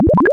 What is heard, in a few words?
Sci Fi Bubble